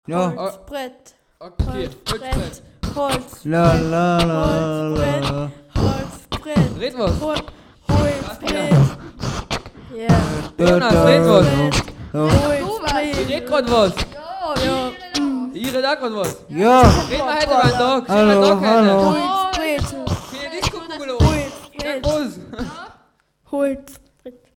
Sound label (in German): holzbrett; moos; litzlberg